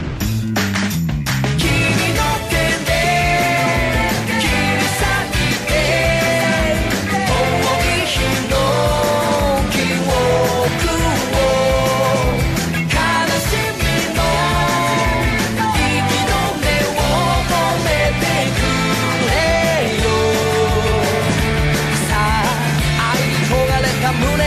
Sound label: opening theme; full metal alchemist